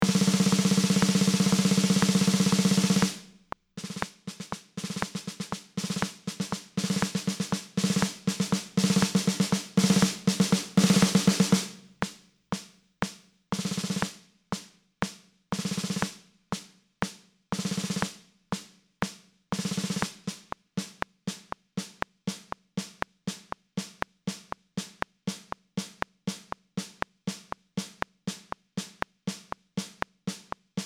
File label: Snare Drum